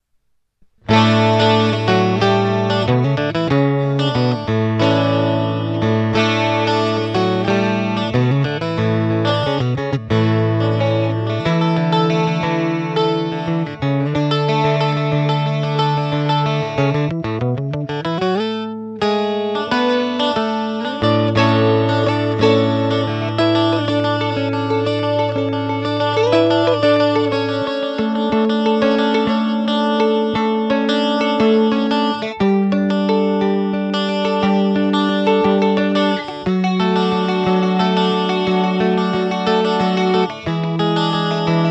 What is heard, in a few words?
bouzouki